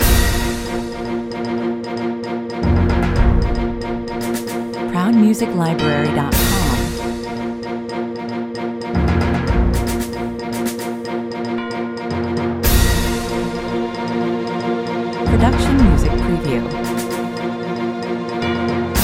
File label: stop press 156 bpm